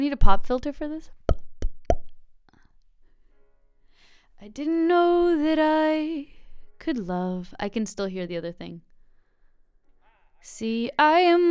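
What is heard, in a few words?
vocals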